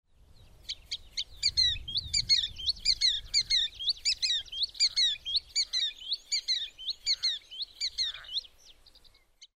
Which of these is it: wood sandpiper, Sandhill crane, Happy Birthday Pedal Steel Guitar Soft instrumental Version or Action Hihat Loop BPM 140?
wood sandpiper